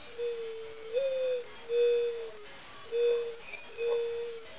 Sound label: deer default sound